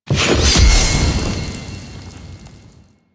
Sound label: Stance One Cast; Warframe; Teshin; Powers